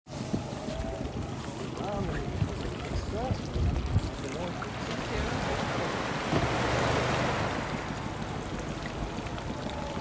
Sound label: Right Whale bay South Georgia morning zodiac cruise